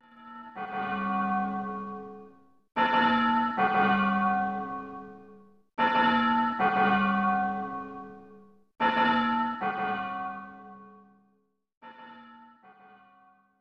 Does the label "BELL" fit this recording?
Yes